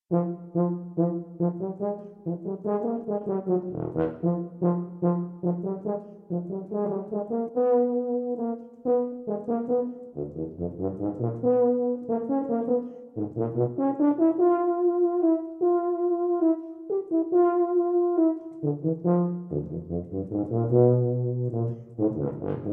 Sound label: capriccio for solo tuba